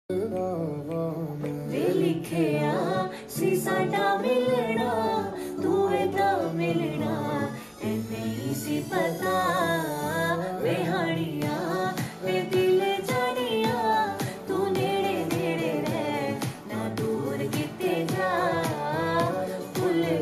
song female version

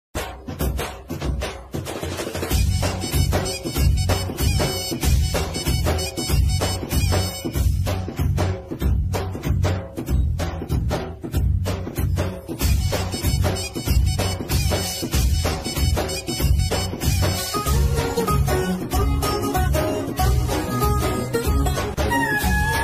song bgm ringtone, new tamil bgm ringtone; pushpa 2

